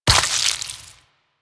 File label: Vial Ability Viral Shatter; Warframe; Alchemist